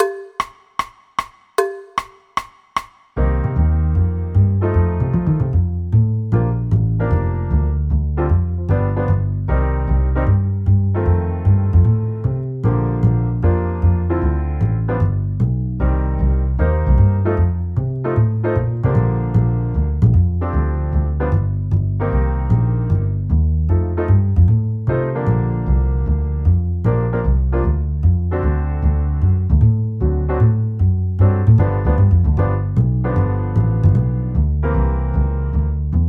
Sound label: Accomp No Drums